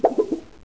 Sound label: Water, 水声; 特酷声音素材库